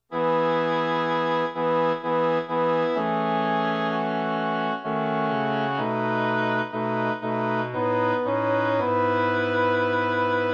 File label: Nunc Dimittis Tenor, choirs; Luvaas; Reunion 2025